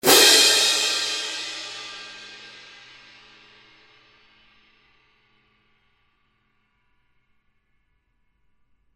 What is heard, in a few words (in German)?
Mischklang